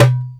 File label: congas, ragga & dub reggae; USB Soundscan vol.27; AKAI; BONGOCONG